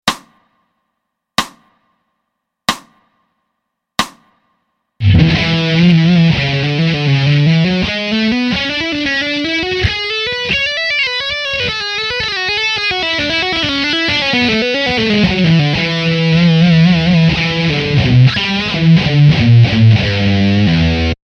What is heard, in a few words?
Legato slow